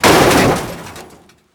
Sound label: metal slam